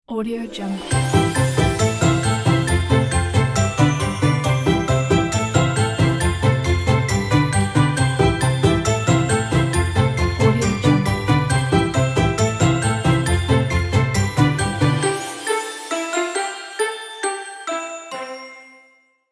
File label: xmas holidays logo